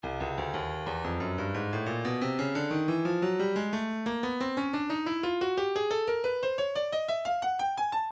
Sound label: breakbeat midi files 115 119 bpm 119; Rat Pack D; Stylus Breakbeat Midi Files